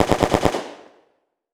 Weapon Machine Gun World War II Historical Short Burst; AdobeCC